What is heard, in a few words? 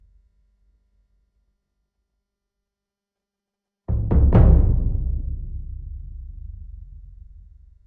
epic drum